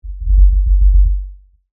Tremor LFE Rumble, Scifi, Tremor, Lfe, Rumble, Science, Fiction; BlastWave